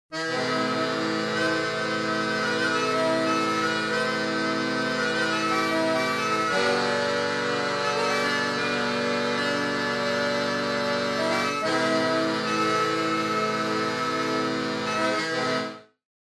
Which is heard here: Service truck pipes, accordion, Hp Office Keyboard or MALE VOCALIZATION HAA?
accordion